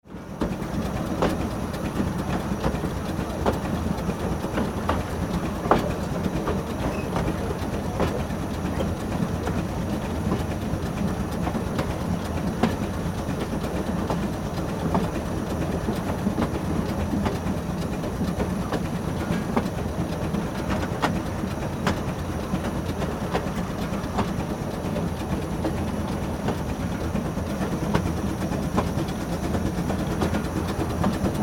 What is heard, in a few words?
old train cabin inside